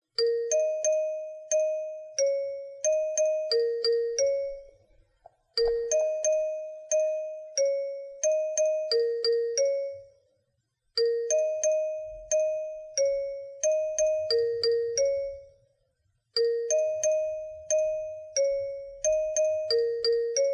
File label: red light green light iphone ringtone; squid game